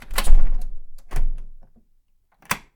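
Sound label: close back door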